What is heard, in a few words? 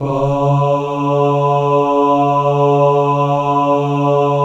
BAH D# 2, Synclavier; ILIO